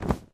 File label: Pillow; Doodle Jump 2; Android